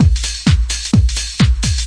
basic stompy house, drum loops and breaks, 129 bpm